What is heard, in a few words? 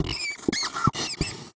Write